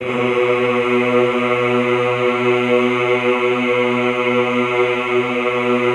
EHH B1, Classical Choir; Best Service; Extended Classical Choir; EHH LO+HI LL

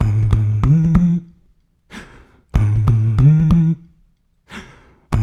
HMM, 93; Spectrasonics - Supreme Beats - World Dance

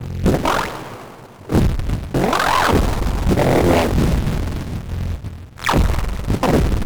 weird glitch sound

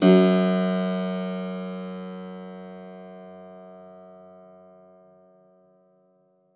SPIN F2, SPINET; Xsample VOL-7

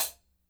HAT, KLANKY KIT; Spectrasonics; Burning Grooves CD2

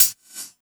HH, 160 BPM; Ueberschall; Drum & Bass Flash Trackz

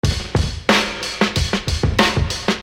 Beat, Hip-Hop Street Beats

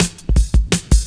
reece steppa cut, drum loops; Zero-G Jungle Warfare 1, 2 & 3

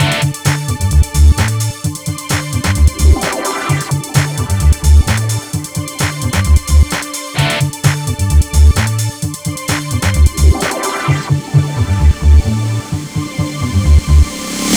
Hardcore Breakbeat, 130 Amin; Big Fish Audio - Hardcore Breakbeat Action; Hardcore Breakbeat Action CD1

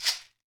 MARACAS; EdgeSounds; Drum Mashines VOL-1

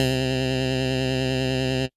Synth C, Synth Prophet One Shots; Electronisounds

